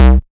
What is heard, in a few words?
dry kick drum c key